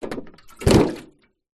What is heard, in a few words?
industrial small metal container with liquid in set down on ground; zapsplat